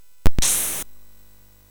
Shuffle, MOS6581R3

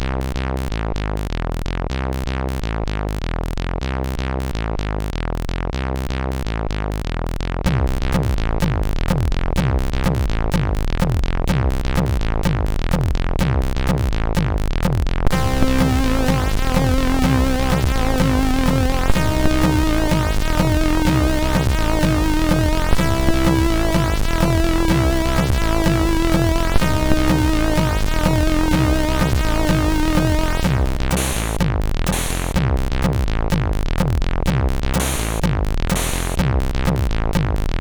Active House, sid MOS6581R3

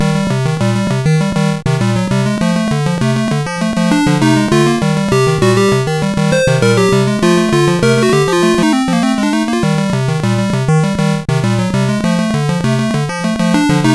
Musicbox, sid CSG8580R5

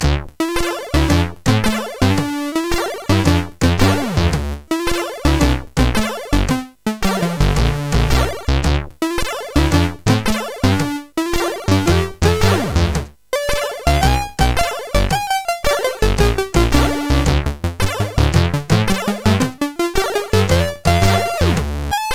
Funk Theme, sid CSG8580R5; Evony

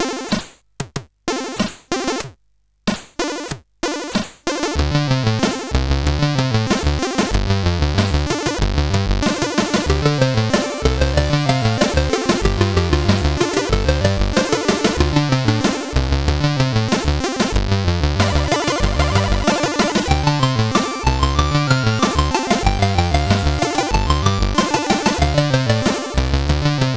Lazy, sid CSG8580R5

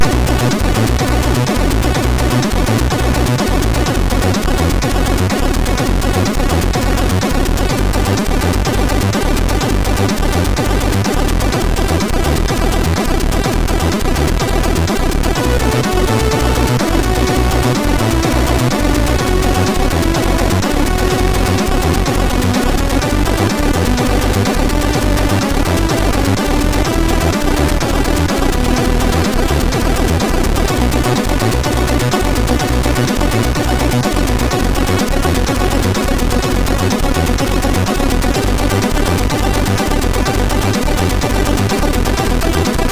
Introtune, sid MOS6581R3; Strike Force

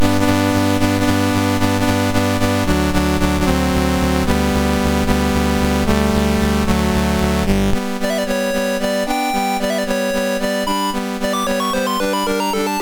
Grand Waltz, MOS6581R3

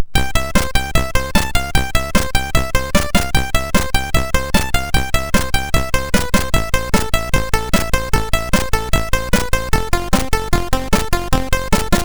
sid, MOS6581R2

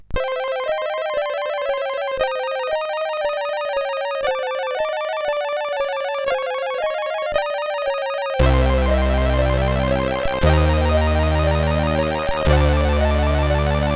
Spooky, sid CSG8580R5

